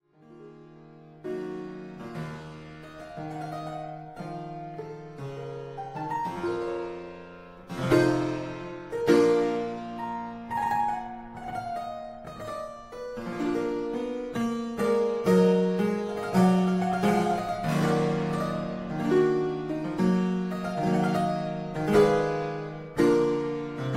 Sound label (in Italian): piano, Cristofori